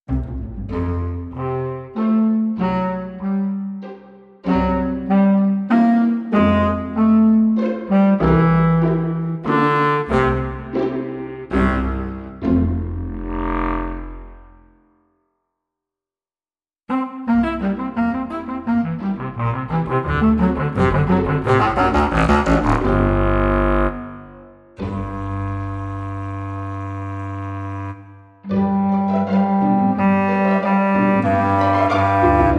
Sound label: Clarinet Single Instrument; VSL